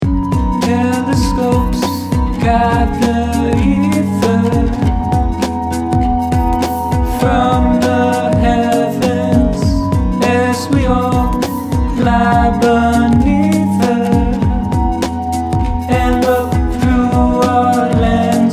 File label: two step vox